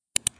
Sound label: Mouse Click